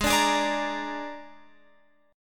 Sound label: G sharp Suspended 2nd Flat 5th, Guitar Open F; strummed